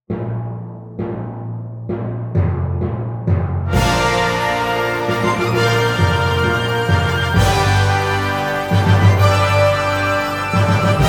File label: New Note Concert G